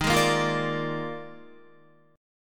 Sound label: D sharp Major 7th Suspended 2nd Suspended 4th, down, Guitar; D#M7sus2sus4 strummed; JGuitar